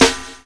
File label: SD FLAM, SNARES; E-Lab; X Static Goldmine 2